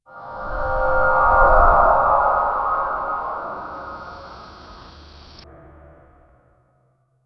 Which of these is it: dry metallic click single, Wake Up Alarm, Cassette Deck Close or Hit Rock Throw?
dry metallic click single